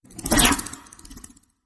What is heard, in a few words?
Fairy Enchanted Flyby